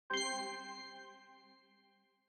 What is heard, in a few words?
win